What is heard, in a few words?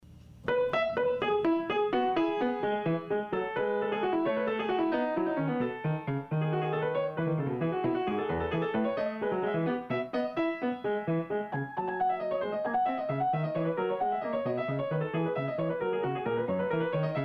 Gigue; Bach BWV 817